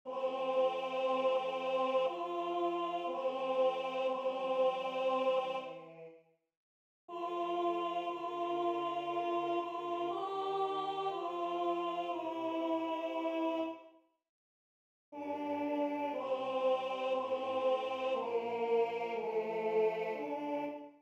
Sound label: Contralto; repertorio polifonico